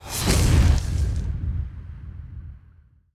Frenzied Explosion; battle suit aces

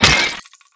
Crate Destroy

